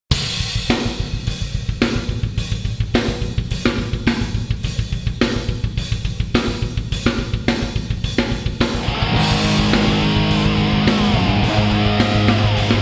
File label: heavy metal